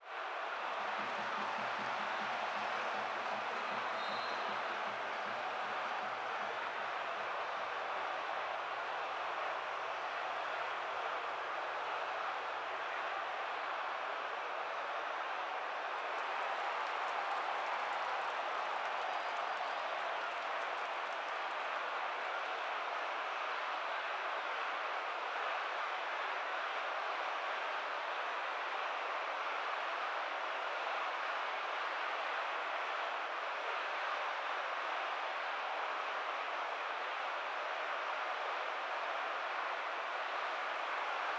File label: Cheering Soundscape